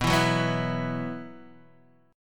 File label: B Suspended 4th; Bsus4 Chord {7 7 4 4 5 X} strummed